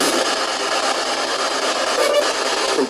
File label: sound distortion